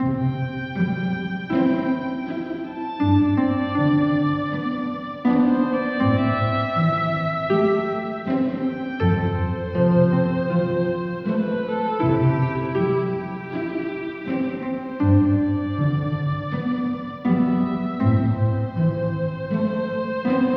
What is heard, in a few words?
strings, sad loop; Loops